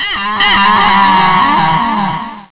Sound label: death